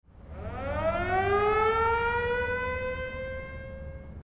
air raid

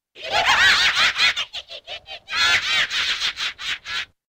mocking flower button, mocking laugh sound

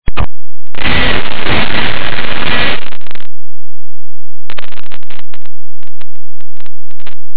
scream mic breaking

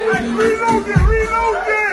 reload

